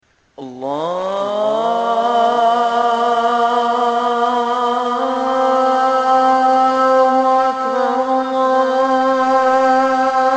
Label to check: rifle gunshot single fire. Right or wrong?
Wrong